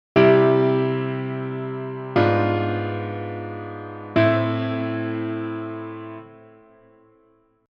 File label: I Vdim7 vi